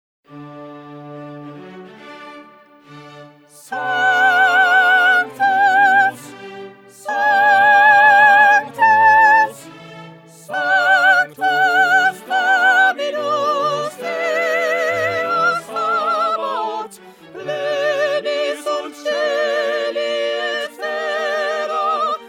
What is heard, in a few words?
Soprano; Schubert; Mass